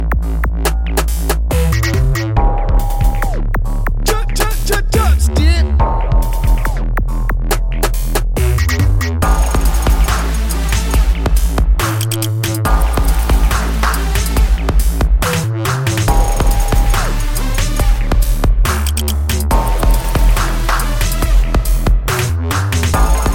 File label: Dubstep Reggae Mix